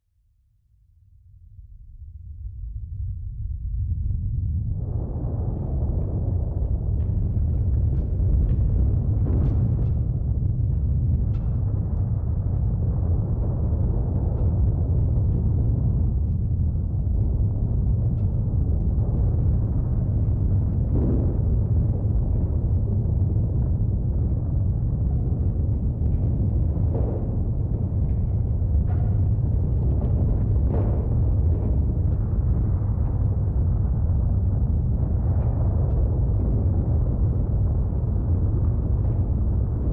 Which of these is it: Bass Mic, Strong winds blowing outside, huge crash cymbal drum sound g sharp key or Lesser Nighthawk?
Strong winds blowing outside